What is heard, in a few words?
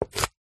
tomato slice knife wooden chopping board